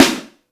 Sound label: Warm Snare Drum Sound C Key, snare one shot tuned to the C note